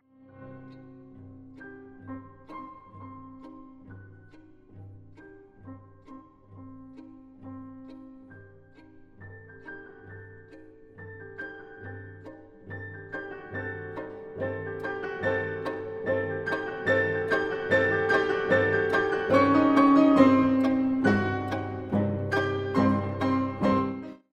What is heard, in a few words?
Piano Trio; Shosti; civic orchestra